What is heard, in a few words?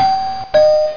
house bell